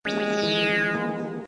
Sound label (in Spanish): sonido pium; ranma